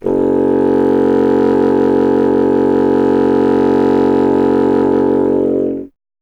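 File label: wind contrabassoon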